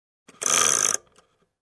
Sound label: Clock gear roll